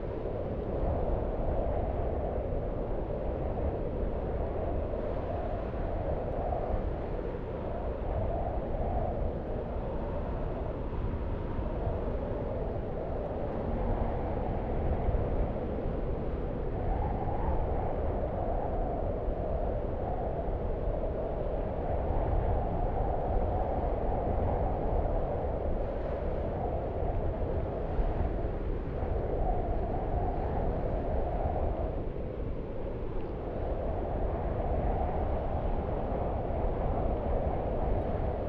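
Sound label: ambience dessert mountain top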